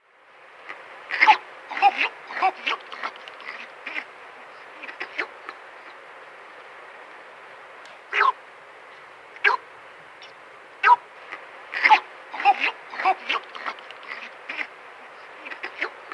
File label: Bald Ibis